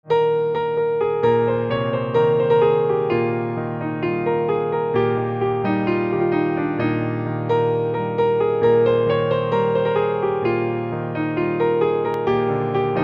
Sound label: Piano, instrumental ringtones